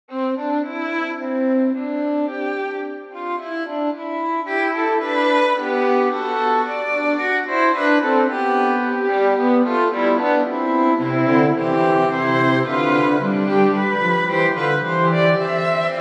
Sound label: fugue for string trio